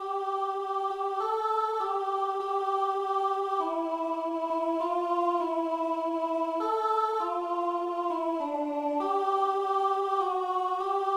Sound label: Alto; Come Sirrah